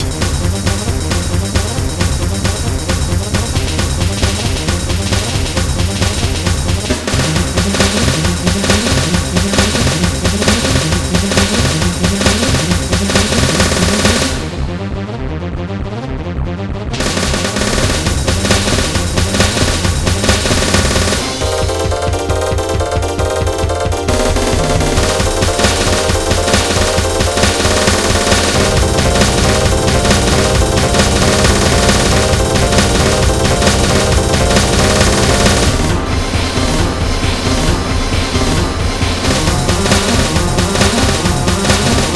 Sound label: TECHNO; battle